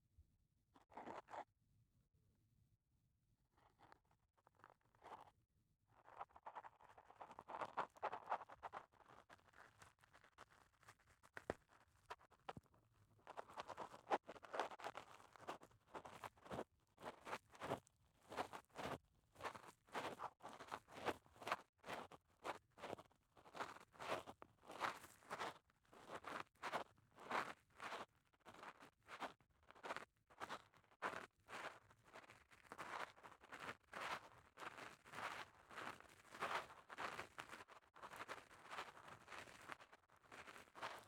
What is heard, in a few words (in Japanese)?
竹耳かき L